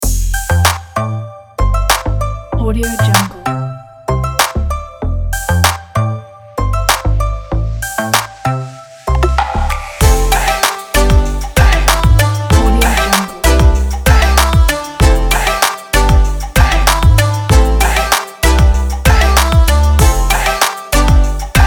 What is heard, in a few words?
future summer hip hop